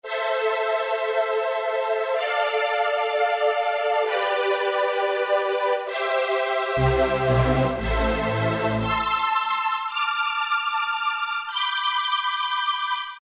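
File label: String Machine, Alesis QS8; StrgMachin; Factory Preset examples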